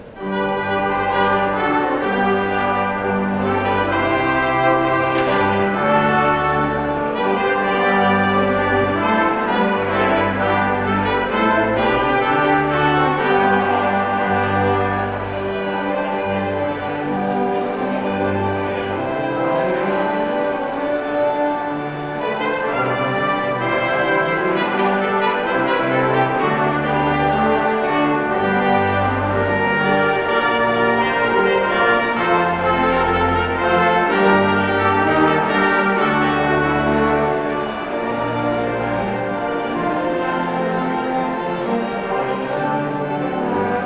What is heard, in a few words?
Postlude; from Terpsichore; Praetorius